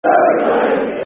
Shit Ground No Fan's [Football Chants (Soccer Songs); fanchants; Ipswich Town Itfc